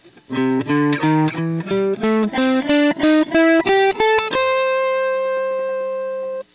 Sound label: blues, guitar